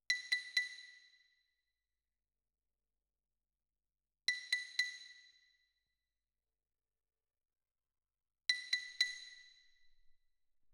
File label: Xylo